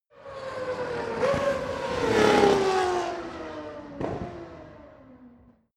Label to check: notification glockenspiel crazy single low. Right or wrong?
Wrong